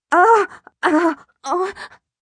Pain Multi Cry, Pain, agony, female, several short cries; human voice